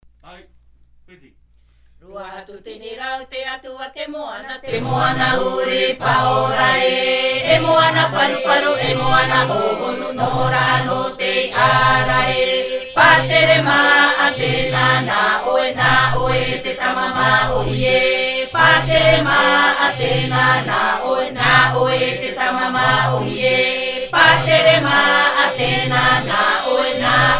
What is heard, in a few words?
Himene tarava Raromatai